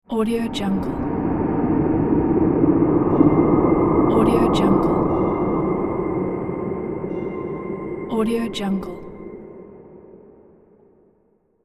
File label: dark scifi transition